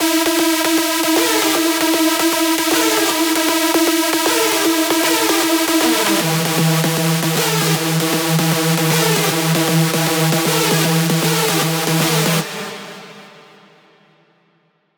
screech loop E min 155; Music Loops; GhostHack

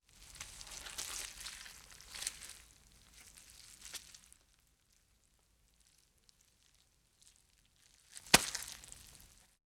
Wet Slimy Sticky Goo; Fairlight Sound Lib

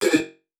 Processed Chant "Dib"; Cymatics; Euphoria Vocal Sample Pack

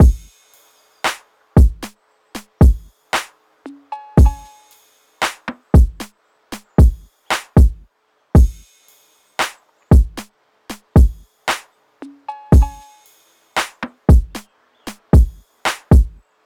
Drum Loop - 115 BPM; Coffee; Cymatics; DOPE DRUMS VOL. 1

